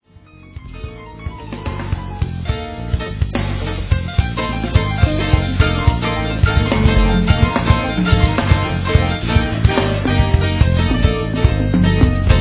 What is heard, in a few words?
sound Check